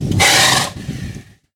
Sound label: snort; Animal breath sound 12 that could be used as hoarse breathing, a nose blowing or even a nasty wet fart!; animal, breath, blowing, breathing out, snorting, rasp, equine, horsey, equestrian